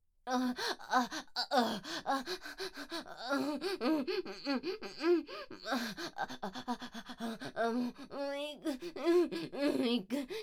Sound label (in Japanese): オホ声; SEなし